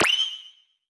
exclamation mark low

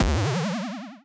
bounce boing jump

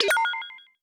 exclamation mark

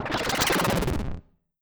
weaken

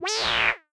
cat shout synth

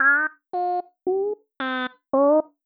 synthesizer voice aiueo high

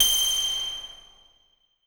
ice beam low short

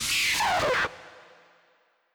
teleportation machine send short

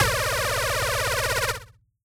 laser cannon

